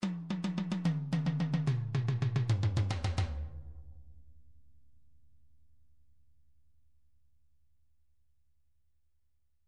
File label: individual drums toms, midi